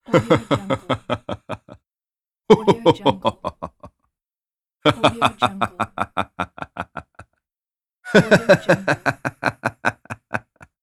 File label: the men laughing